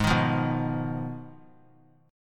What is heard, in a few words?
Ab Minor 6th Ab, down, 3C, 3F, 3A, 3D; Abm6 strummed; JGuitar